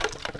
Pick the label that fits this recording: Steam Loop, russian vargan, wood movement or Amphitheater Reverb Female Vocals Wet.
wood movement